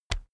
keyboard external key push

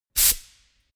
pneumatic pump burst

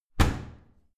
washing machine modern close hard

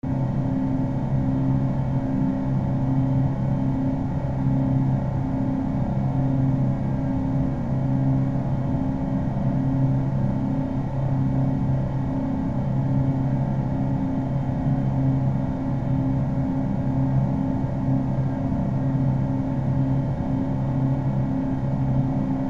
energy phase control area

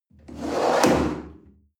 chair wood slide on floor hard bump

